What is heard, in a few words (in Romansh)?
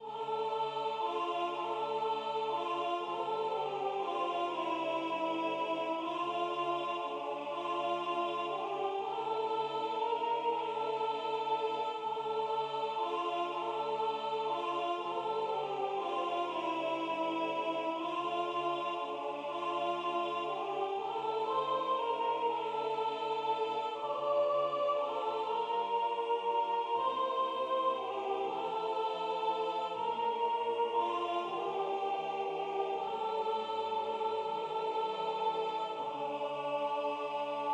Tschiera Sopran